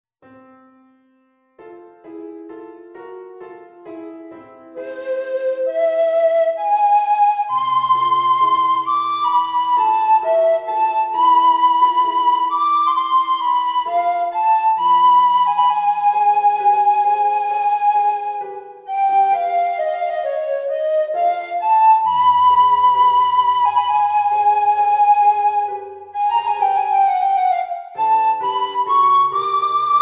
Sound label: Sonata Treble Recorder